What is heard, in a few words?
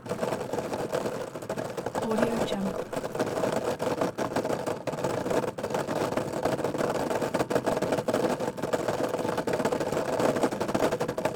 skateboard skating